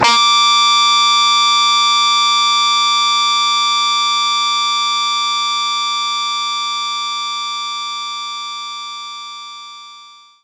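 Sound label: Guitar Harmonics Gb2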